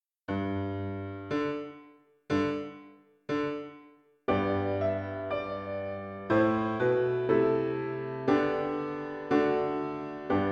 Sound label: Piano